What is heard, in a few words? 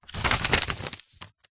foley paper sheet a4 fold in half; zapsplat